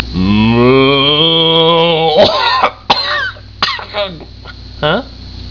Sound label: moo